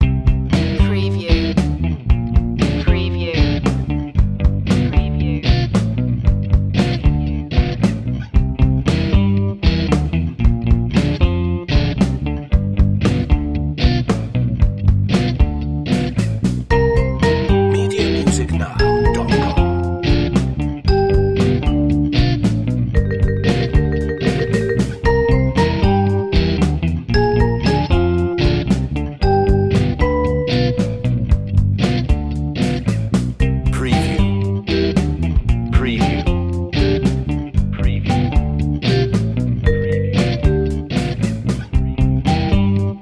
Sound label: childrens soft rock